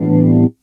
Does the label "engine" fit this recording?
No